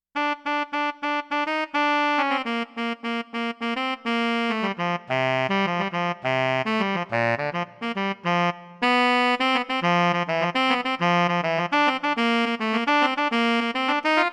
Tenor Saxophone Technical

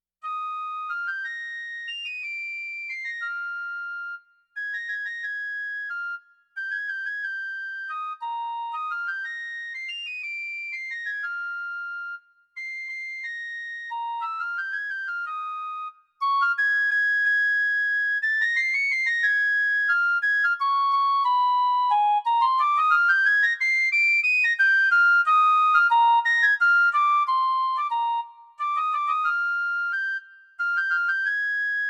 Piccolo Lyrical

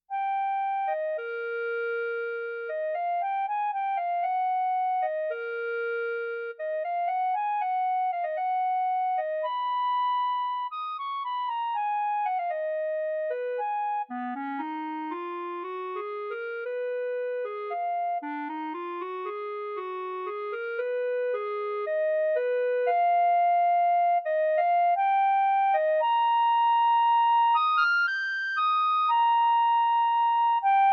Eb Clarinet Lyrical

